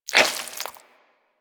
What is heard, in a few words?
Armadillo Tongue Move, Foley; Warframe; Hunting Critters